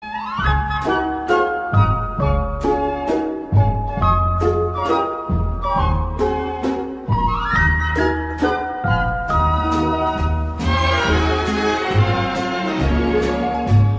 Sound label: instrumental